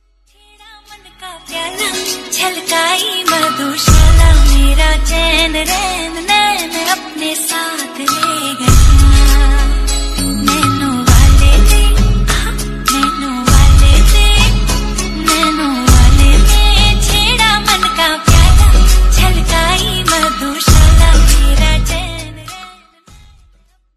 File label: hindi music punjabi ringtone